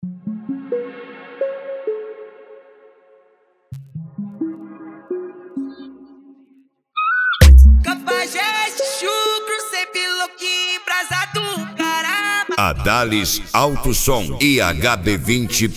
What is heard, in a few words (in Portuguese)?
esp de cavalgada; adales auto som; hb20 panther black